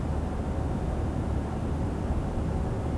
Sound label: ambi; Mafie 1